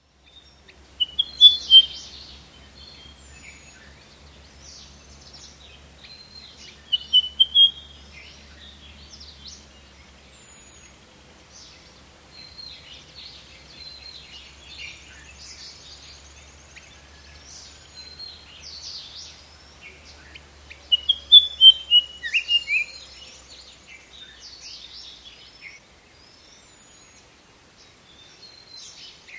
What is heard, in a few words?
Dawn chorus, Madumbalai National Park, Tamil Nadu (S India)with bird song,parakeets, bulbuls and insects; Outdoor Sound Effects; Atmosphere Dawn; BBC